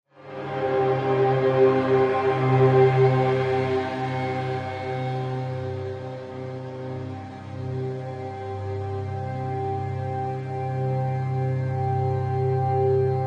Sci Fi Atmos Tonal, ambience

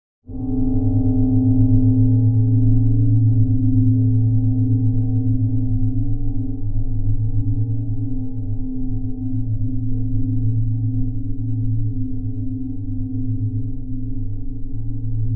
Bell Ghostly Feedbac

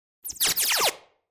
Space Machine Beep

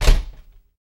Door Push Bar Door Cl

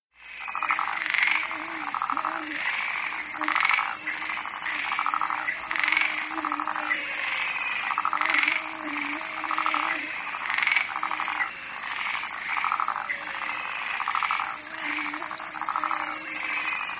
Frogs Bleats Pond

